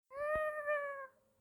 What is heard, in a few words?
meow